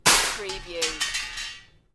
Metal Crash Drop Debris Settle

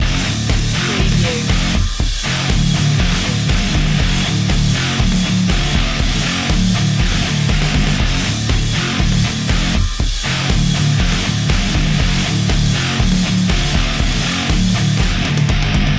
pump action loop

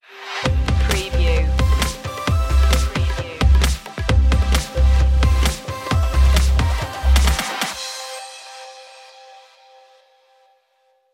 urban journey stinger

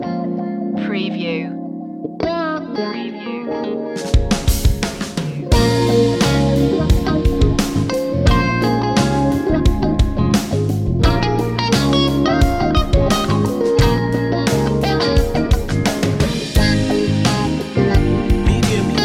funky love

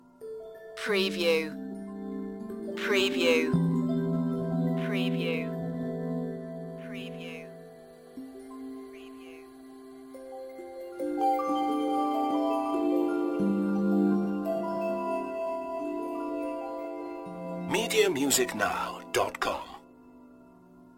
dream bell loop

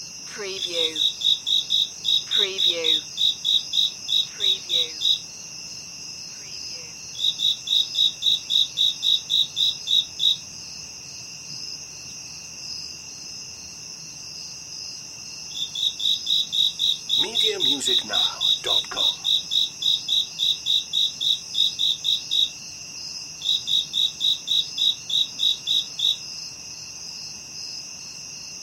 Crickets Insects

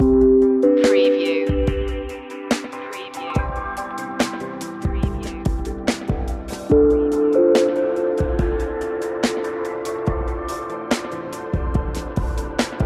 emergent lights loop

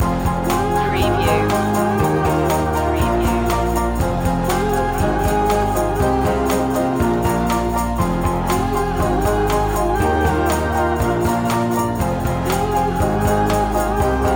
reykjavik loop

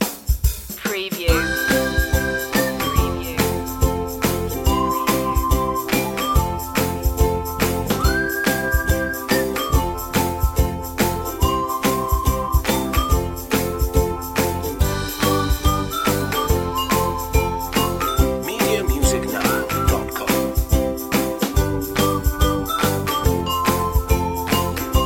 jolly ukulele; positive music

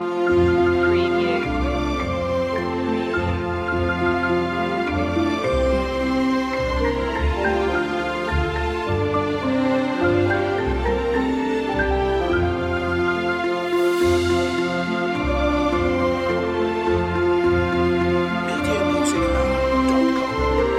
orchestral loop; the holly and the ivy; media music now

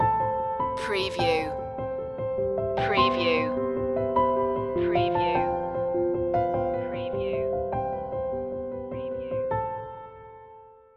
love and heartbeats stinger

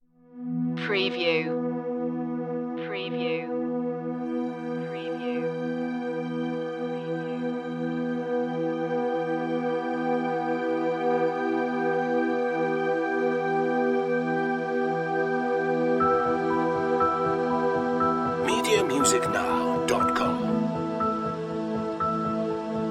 no clarinet

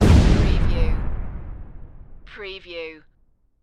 Explosion; lynne publishing

